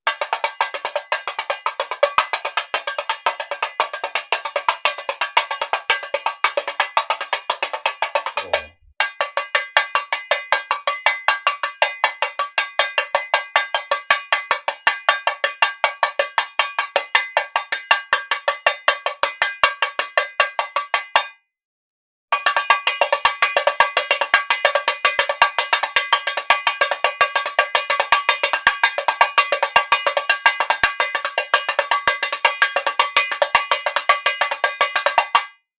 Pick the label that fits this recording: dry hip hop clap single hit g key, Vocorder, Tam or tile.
Tam